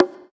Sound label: Percussion, House